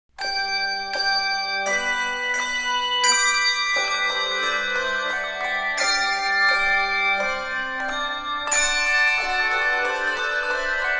Holy, Holy, Holy, Handbell Choir; Pillsbury Baptist Bible College

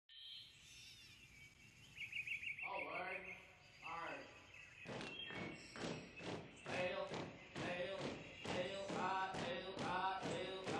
chorale cadence

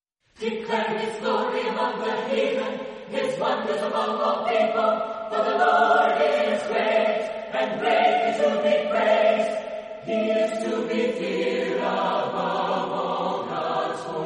Chorale, Voices in Praise acappella

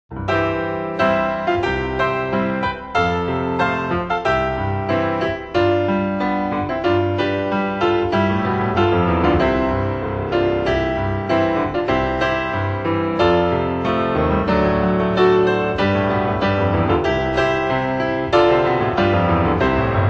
Keyboard

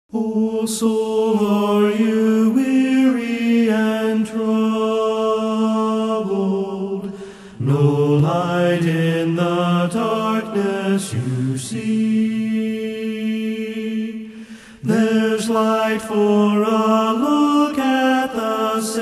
A Cappella; Turn Your Eyes Upon Jesus

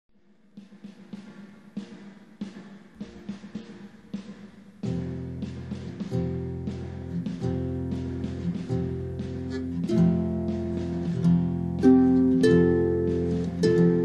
Harp

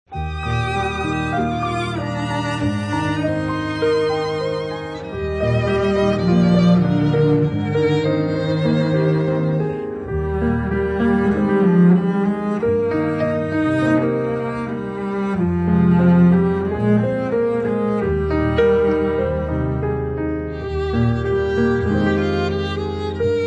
Praise Him with Strings

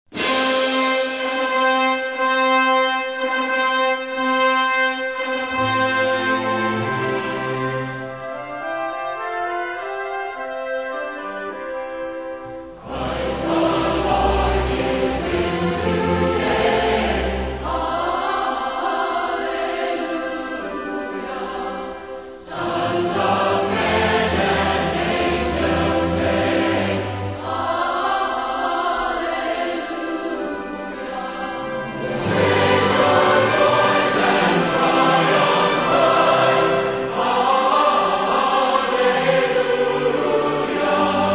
Philharmonic Orchestra + Choir

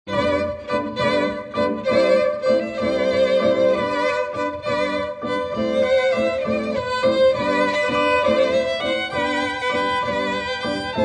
Strings

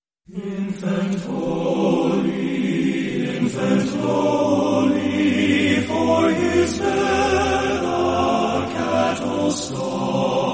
men's chorus

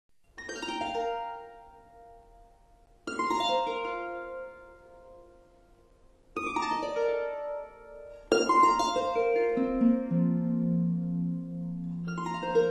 o holy night, christmas carols on the harp

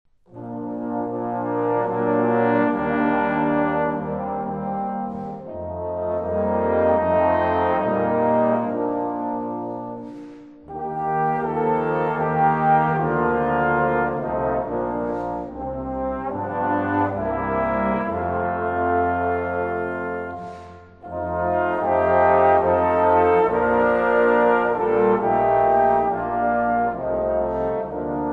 brass ensemble

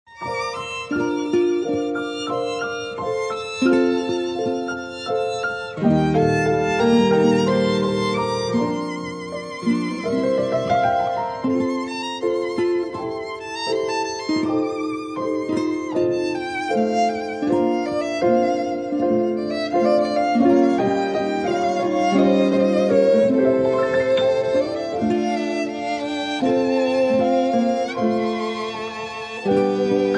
Strings

